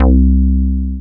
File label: SEQCR BASS, ROLAND MKS-80; Rubber Chicken Software Sample Bank